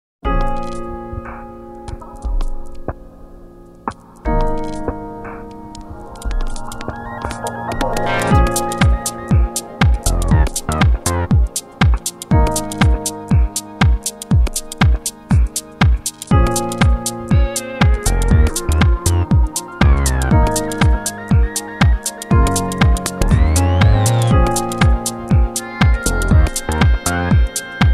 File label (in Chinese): 专题片背景音乐